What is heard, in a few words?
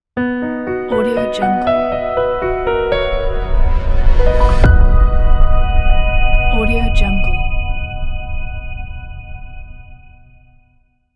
elegant logo